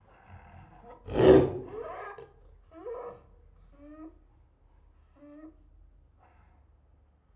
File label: Angry Loud Roars, Tigers; Sound Ideas